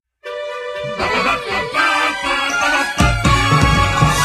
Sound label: Volcano Intro; Volcano - Part4 Intro